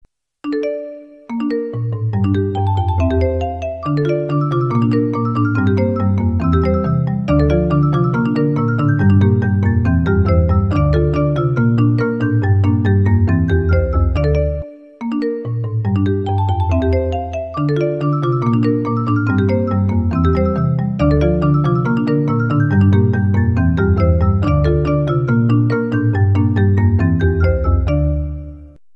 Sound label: iphone 12 pro max marimba